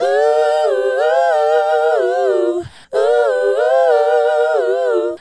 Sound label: Female Group, Hip-Hop and RnB Vocals